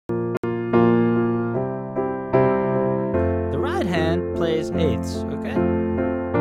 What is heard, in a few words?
Fills Licks Riffs 8ths and quarters, piano